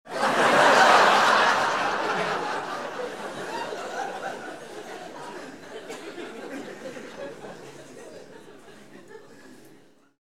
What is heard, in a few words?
laugh long